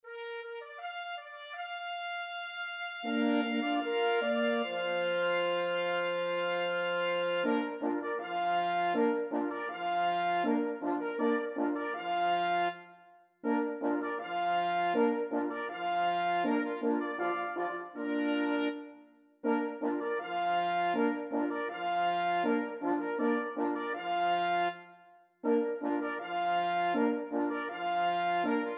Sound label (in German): Fanfarelle